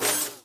gunslinger swing, weapons; tf

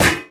physics turret gibs

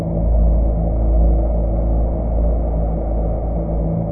abstract air, tones; source engine; portal2; ambient

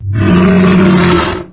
attack growl, ichthyosaur; Source Engine; video game; npc

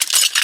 zipline, combine soldier; episodic; Source engine

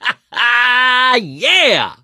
positive noise, mechanic, survivor, voice; source engine; left 4 dead 2

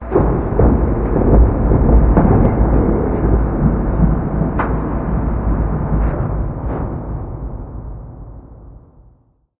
amb muffled lo mach; video games; ambient

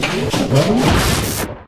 citadel breaker shut; ambient; source engine; episodic

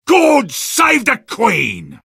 battle cry; vo; sniper

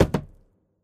phys cube, physics

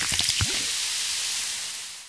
toxic slime sizzle; ambient; canals; source engine; hl2; video games

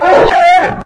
hunter defend strider

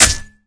axe hit flesh, weapons

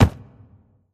phys cube, physics

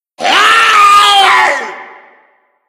smoker warn, voice; source engine; left 4 dead 2

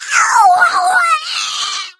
death, infected female

